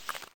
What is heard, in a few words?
SCROLL